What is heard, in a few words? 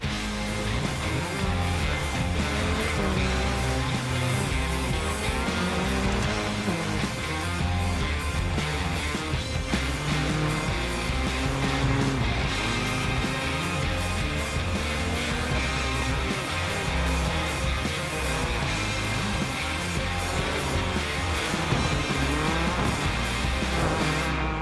Video Victory; DiRT 4